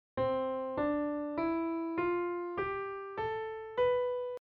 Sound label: C Scale